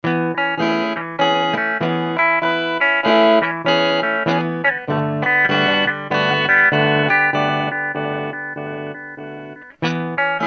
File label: Tap Swing